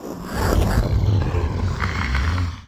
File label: pinky chatter, monsters vocalizations; DoomRTX